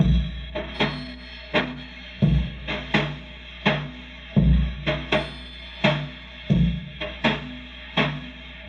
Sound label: Chamberlin Rhythmate Loop, Drum Machines; Chamberlin Rhythmate Sample Pack; 300 Drum Machines